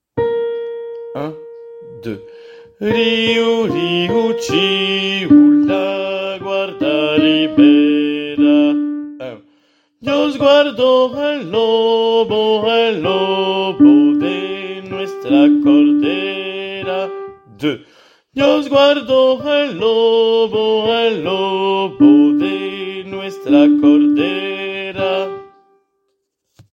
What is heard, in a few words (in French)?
refrain bassi, chorale